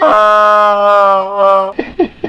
die, zombie poison; Half Life 2

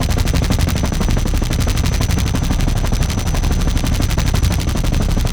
rotors loop, combine helicopter

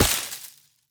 foliage impact bullet; physics

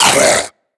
choke, zombie vox; creatures

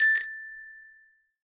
bicycle bell; scripted sequences

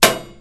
metal computer impact hard; physics

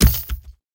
weapon heavy impact; physics; weapons

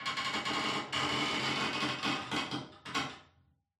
ambient wood creaks

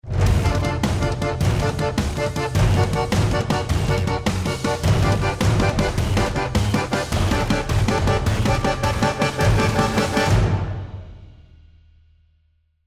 bomb ten sec count

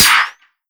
npc charger shield, bullet impacts; physics; Half Life Model Pack

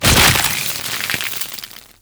host emerge, creatures, headcrab reviver

